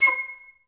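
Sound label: flute staccato C4; Best Service; Peter Siedlaczek's Advanced Orchestra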